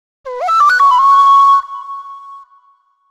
Eon Flute; Pokémon Omega Ruby & Alpha Sapphire

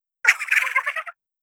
Voice Laughter; Callie; Splatoon Live in Makuhari - Shioka Live

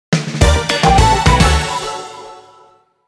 Reward Jingle; Alterna

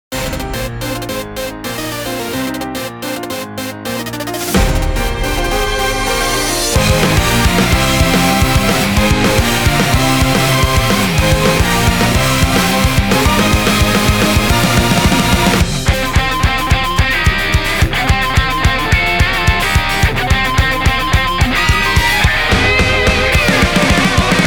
Instrumental

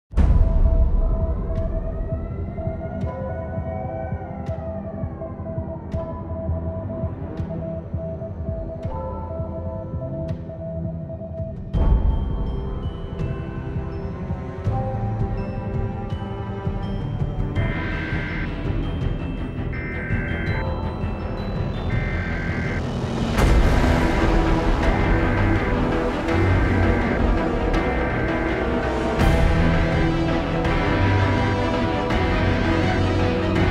Epic Orchestral Cover

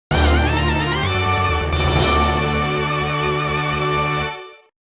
Summon Music; Egyptian Gods; YuGiOh Over the Nexus

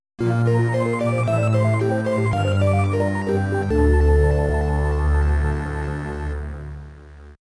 Item Obtainment Jingle; Metroid Prime & Fusion Original Soundtracks

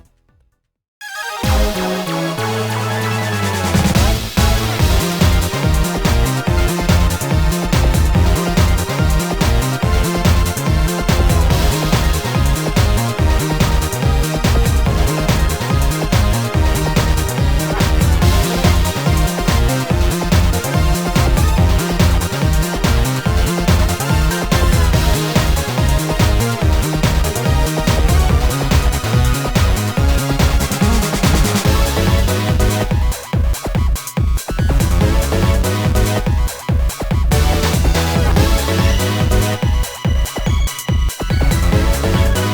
Duel Music - Winning; YuGiOh Over the Nexus

